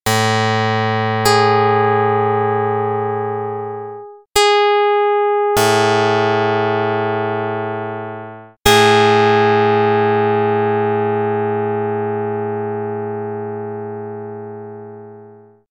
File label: pluck; 15/4; dr110